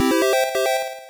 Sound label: Period Begins (Charge); Jordan vs. Bird: One on One; Tandy 1000